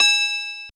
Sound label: RBell G#6